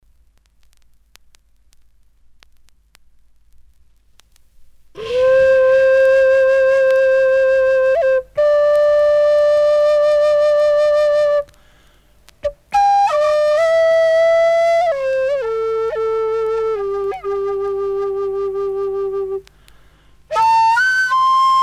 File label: Flute, jazz